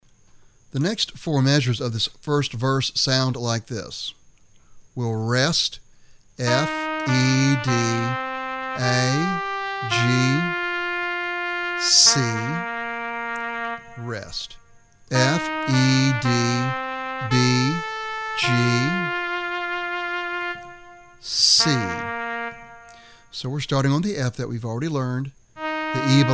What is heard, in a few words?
Intro Trumpet